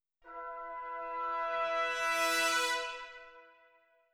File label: trumpets; combat results win; Netease